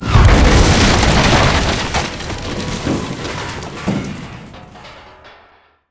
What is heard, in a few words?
lodge explode; ambient; Half Life 2; Episode 2; outland